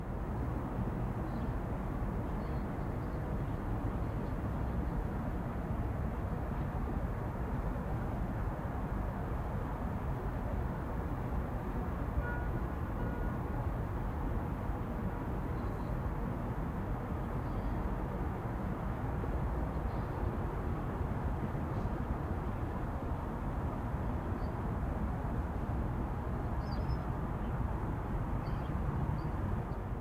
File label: city ambience